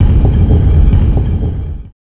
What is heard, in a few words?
ROCK